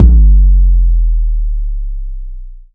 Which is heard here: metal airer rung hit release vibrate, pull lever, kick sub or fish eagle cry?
kick sub